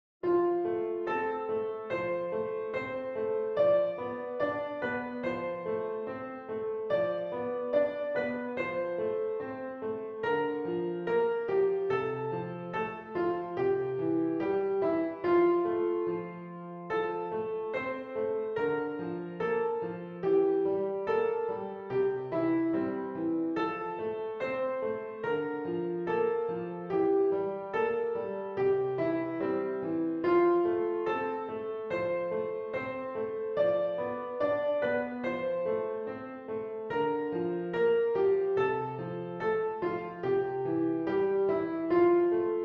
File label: Rocking Carol piano; christmas music and carols